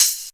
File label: ohh, hihats; house; PS-28 Vocal House Samples; Drum & Perc